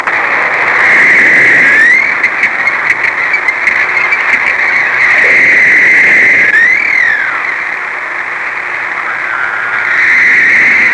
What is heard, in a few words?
sperm whale; ocean animals; Sound Effects for Multimedia - Volume 1